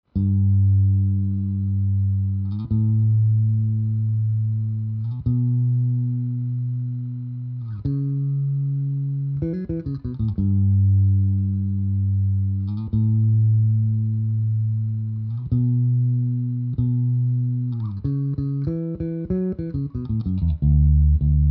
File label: di bass stereo